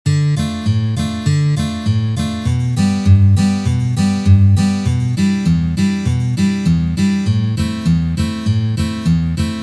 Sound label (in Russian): Basovye struny dlya akkordov pri perebore blatnoj boj